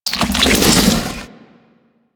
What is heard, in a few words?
Flesh Door Open; Ambience; Props; Warframe; Infested Eris